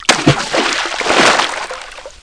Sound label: Splash 2 water; RoleAid